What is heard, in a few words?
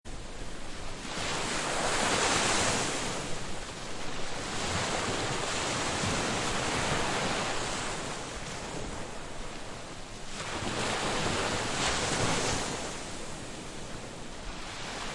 waves beach